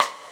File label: GATE RIM, Drums Acoustic; USB Soundscan vol.10; AKAI; 90s sample CD; GATEKIT 2